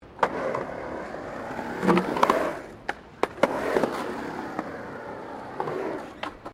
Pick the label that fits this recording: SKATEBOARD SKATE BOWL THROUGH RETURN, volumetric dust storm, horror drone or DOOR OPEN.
SKATEBOARD SKATE BOWL THROUGH RETURN